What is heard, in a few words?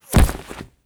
cloth bullet impact; Rust